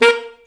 SAX A. STAB, Alto Section; Roland L-CD702

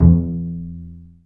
Bass Piz A F2; Digital Sound Factory Virtuoso

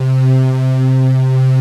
STR HIT; Pro-Rec - Synthsonic

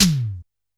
A2 - E Tom, Yamaha PSR-E323 Electronic Kit

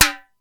Simmons SDS-9

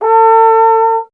Trombone A; Miroslav Vitous - Solo Instruments VOL-1; 90 s Sample CDs

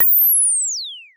Klone Dual Percussion Synthesiser, FREQ

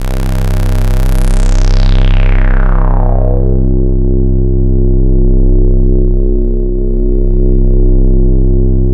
LUCKY LEAD, KURZWEIL K2000

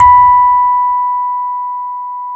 Electric Pianos; Wizoo

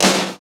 SD Real, Roland; Sound & Vision - Gigapack I

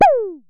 Synare Filter; Star Instruments Synare 3

